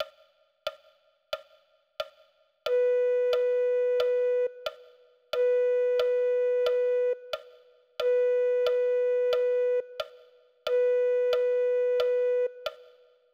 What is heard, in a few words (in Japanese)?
リコーダー, 「シ」「ラ」「ソ」の 音です; ４つ カウントが 入るので，それ を聞いてから 演奏に 入って ください; 「シ」「ラ」「ソ」の音は，左手しか つかいません。